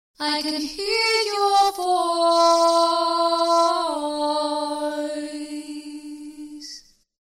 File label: Dual Delay Metallic Delay Wet; ClonEchoes; Syntheway